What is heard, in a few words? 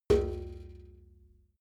metal bucket hit soft